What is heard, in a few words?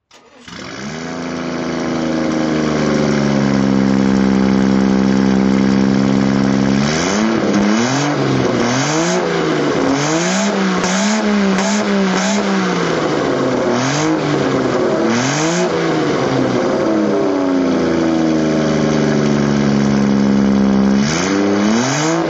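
jaguar supersport throttle, Engine sound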